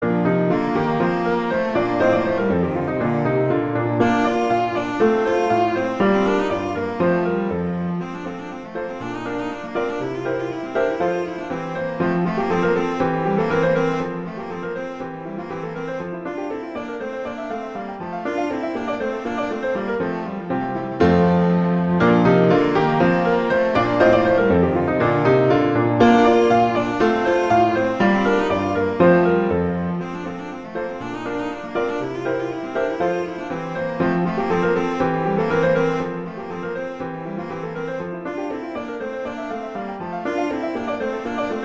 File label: Allegro; Vivaldi; Op14 No1